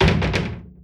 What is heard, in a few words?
Wardrobe Close